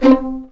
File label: C4 PIZ MF, string; East West; The Ultimate String Collection